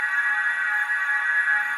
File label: shield loop; LoL; lulu